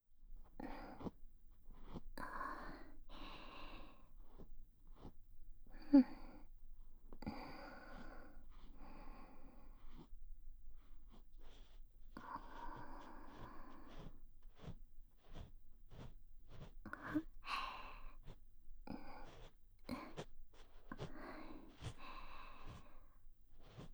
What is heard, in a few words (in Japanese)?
耳かき（左）ループトラック; フォーリーサウンド; 耳かきASMR✕裏オプ援交～人気耳かき店の看板JKとリピ限裏メニューで恋人同棲生活してみたら～